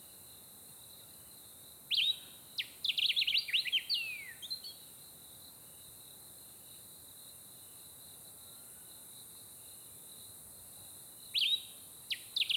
Jungle 2pm, Swaziland Mkhaya, SOUTH AFRICAN CRICKETS; Tonschliff